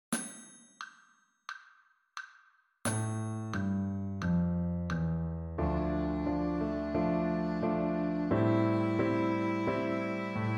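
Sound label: D natural minor scale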